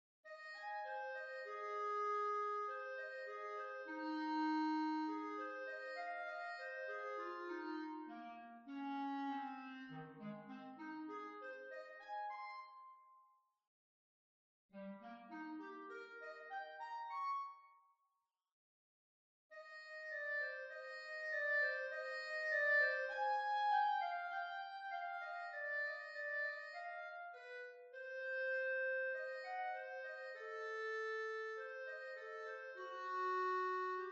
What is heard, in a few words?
Clarinet Track